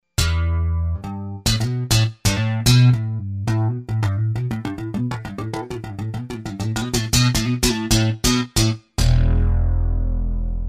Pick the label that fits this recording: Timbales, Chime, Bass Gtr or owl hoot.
Bass Gtr